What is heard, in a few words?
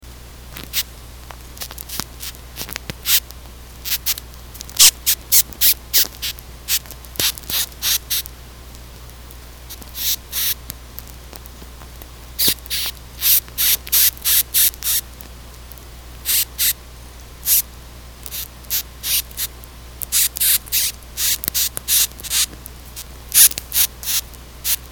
horned passalus, beetles